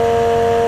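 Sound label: engine exhale, advanced engine sounds; engine2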